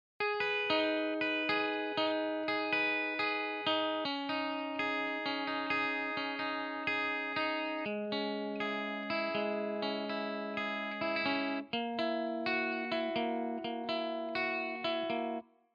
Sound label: les paul wet; chiqui audio